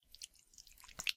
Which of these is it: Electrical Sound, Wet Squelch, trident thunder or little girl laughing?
Wet Squelch